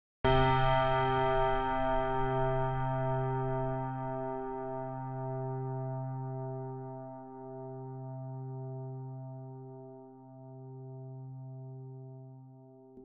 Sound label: simple decay, synthesis